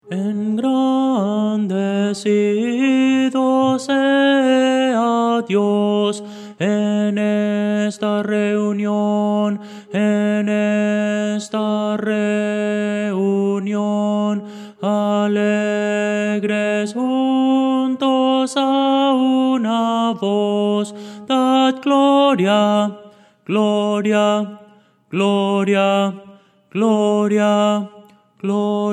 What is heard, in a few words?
tenor; Voces para coro